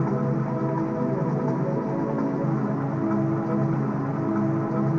keys, Loops, Sad

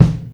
Kicks Loud

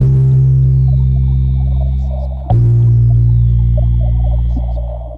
bass, Loops

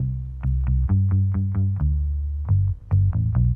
bass tin whistle, Loops Phonky

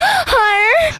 SIGH harder, orgasm; ooh ahh

